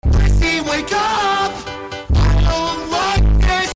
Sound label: wake up bass